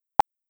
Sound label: button Click